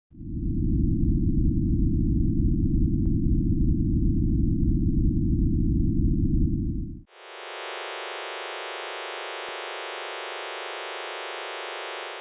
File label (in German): ergo messignal